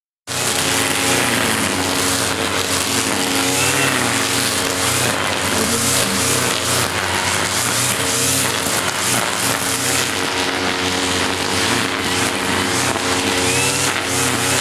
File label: lawn mower cutting grass